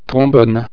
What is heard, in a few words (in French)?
trombonne